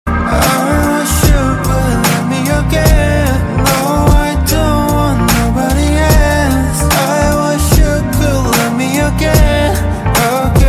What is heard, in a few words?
ringtone